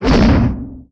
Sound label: axe swing; statue